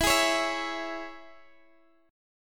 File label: C sharp Minor E